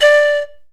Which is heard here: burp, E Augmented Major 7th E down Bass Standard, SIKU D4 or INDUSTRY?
SIKU D4